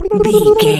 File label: voice fun character flutter man